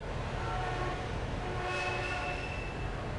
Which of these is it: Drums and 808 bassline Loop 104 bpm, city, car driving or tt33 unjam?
city